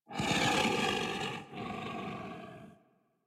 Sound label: Feral Vocal Breath Walk; Enemies; Kubrow; Warframe